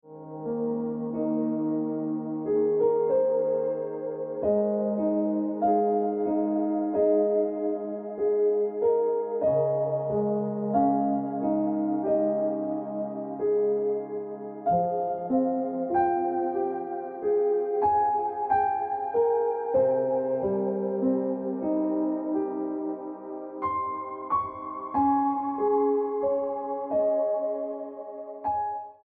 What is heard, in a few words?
Keep It Colorful Ringtone Piano Music